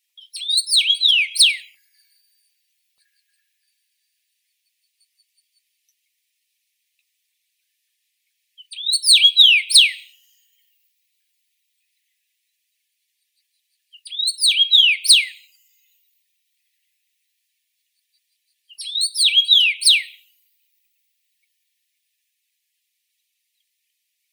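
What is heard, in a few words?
common rosefinch, Carpodacus erythrinus; chechevitsa obiknovennaya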